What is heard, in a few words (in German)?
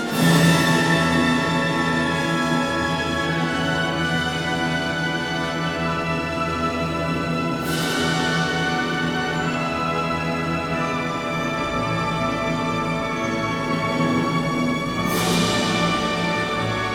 Eine Alpensinfonie (An Alpine Symphony) for orchestra; Sonnenaufgang; Strauss